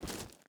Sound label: footstep rubber boots gravel walk; Rust